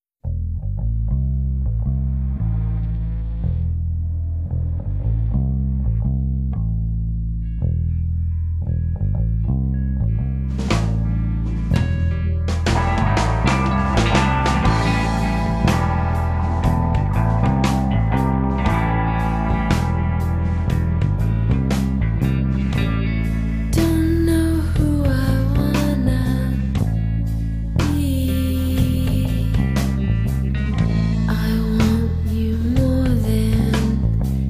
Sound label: bass song